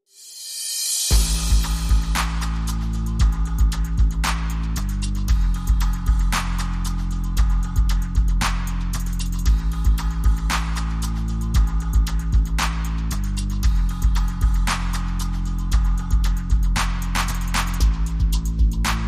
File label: Drums and Bass Mix